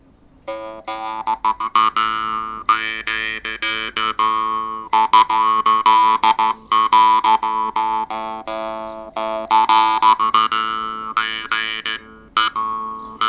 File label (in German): Zupfen Pluck